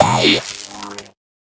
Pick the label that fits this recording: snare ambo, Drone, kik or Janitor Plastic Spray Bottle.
Drone